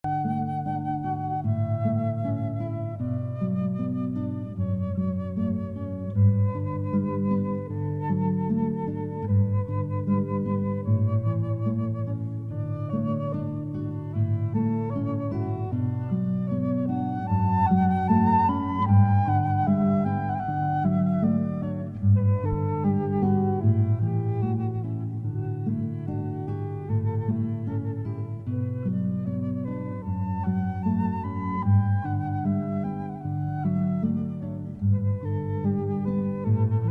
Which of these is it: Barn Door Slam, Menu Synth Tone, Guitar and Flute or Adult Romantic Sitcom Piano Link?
Guitar and Flute